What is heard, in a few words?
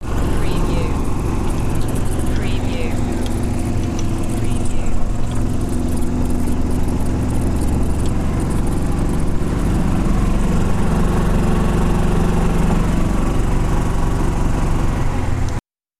Golf Cart